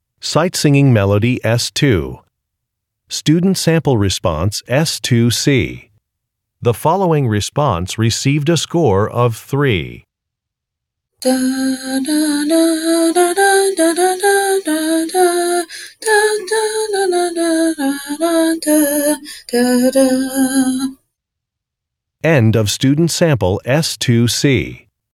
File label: music sample; Sight-Singing